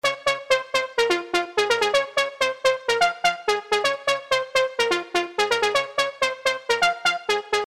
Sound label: Lead